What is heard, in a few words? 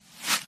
Arrow Swish Reversed